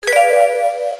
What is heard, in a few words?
Key